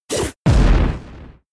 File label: Kick; Rolling Kick